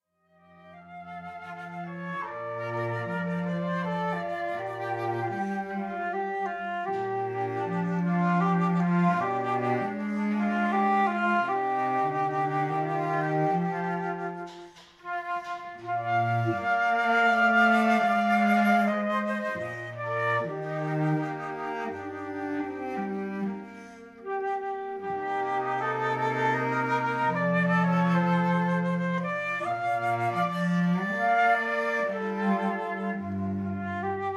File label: 2 Flutes, Cello